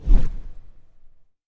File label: Micro Air Swoosh